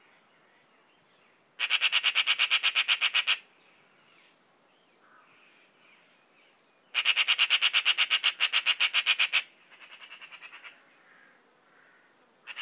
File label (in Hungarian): szarka hangja; szarka madar